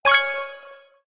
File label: collect item; Henry Danger - Day Of Danger!